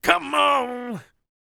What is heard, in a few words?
Vocal Planet R&B & Dance; ILIO